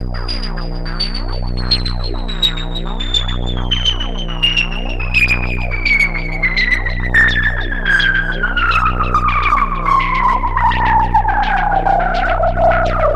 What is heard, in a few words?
analog, special fx twisted; USB Soundscan; AKAI